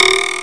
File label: sprung; Amiga-CD - Volume 2; dolbytest